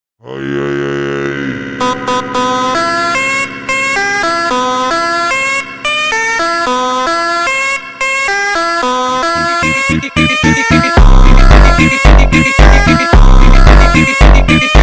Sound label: Funk slowed